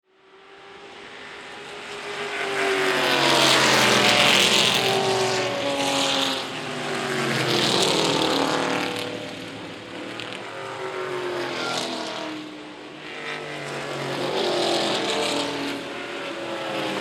Race Car, Many Cars Drive By, 1960S Era Road Racers; Race Car , Many Cars Drive By , 1960s Era Road Racers , 6 Cylinder; Vehicles